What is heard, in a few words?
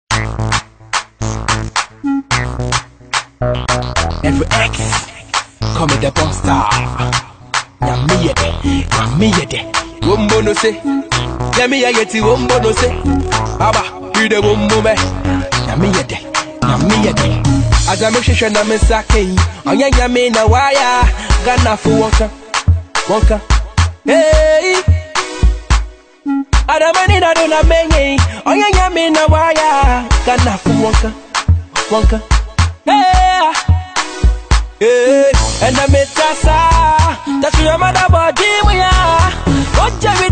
Gospel Afrobeat Mix; gospel afro beat praise medley